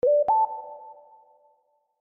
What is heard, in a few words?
Modern Whistle